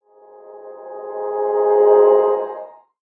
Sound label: Sweeper Tonal Swell Reverse; Blastwave; Production Element Imaging Element Accent Transition